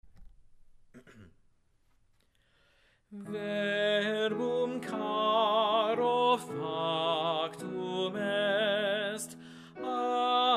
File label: Tenor; Verbum Caro Factum Est, Anon.